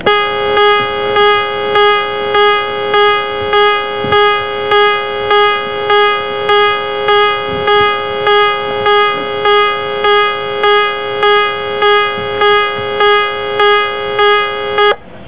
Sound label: general alarm